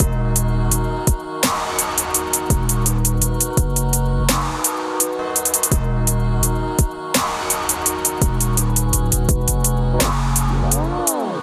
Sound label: Deep Knight groove 120bpm; MIDI Clips; Ableton Factory Packs; Beat Tools